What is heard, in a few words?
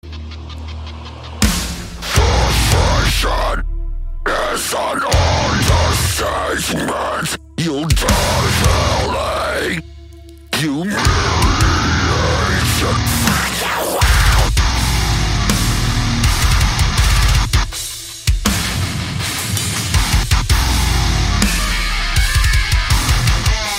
Disgusting breakdown